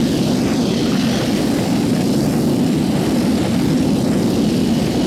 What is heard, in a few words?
jetpack